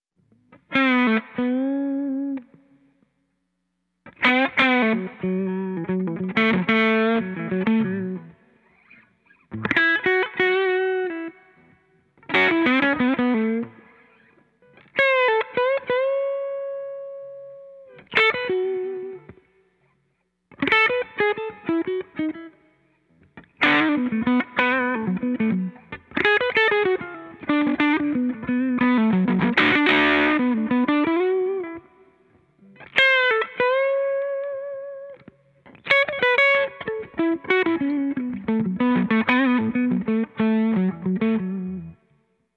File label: parlor thru MKI amp solo; Myka